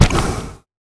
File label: heart drop